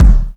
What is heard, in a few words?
sfx perc